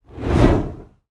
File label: fire large flame torch swoosh passed; sound effects one